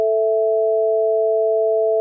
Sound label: sine a1 + e2